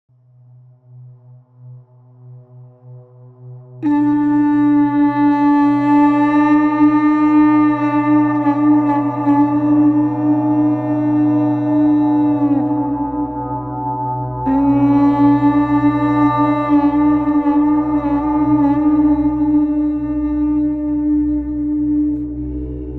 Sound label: Pukaea